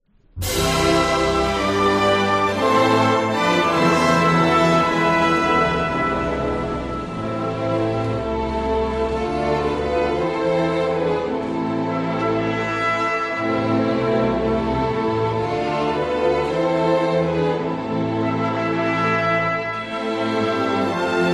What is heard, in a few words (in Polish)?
Hymn Bulgarii